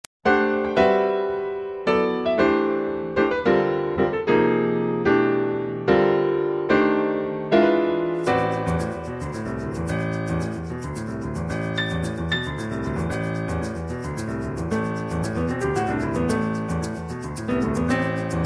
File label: brazilian dorian dream